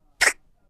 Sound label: rubber toy short